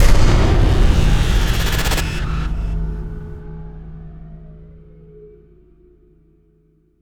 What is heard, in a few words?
stinger Critical Fail